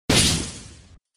Sfx Wizard Attack Hit

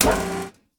Panel Beverage Open; Among Us

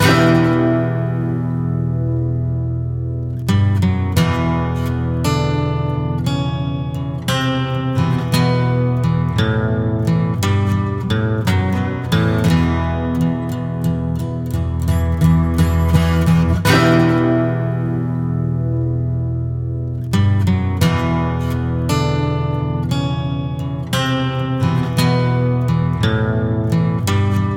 Party Fowl (Lose); Cooking Dash

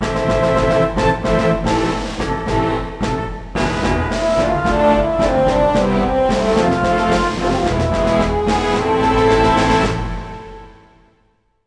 Duel Music Lose; Sid Meier's Pirates

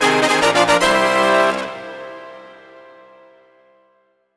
Prototype Level Clear - Fanfare; Face Raiders; Nintendo 3DS

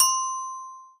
Double coins off; Talking Tom Gold Run

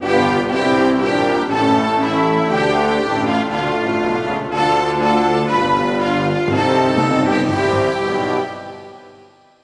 Anthem Jamaica

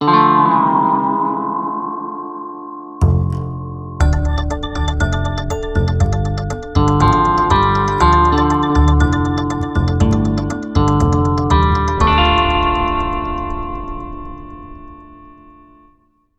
Quest Stinger; Buried

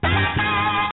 Fanfare (US Credit SFX)

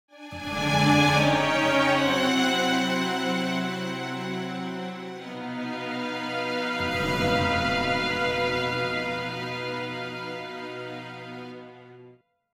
Defeat; Eternal Wheel

